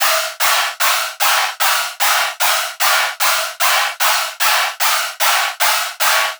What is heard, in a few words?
synth loop hype layer 150; Virtual Riot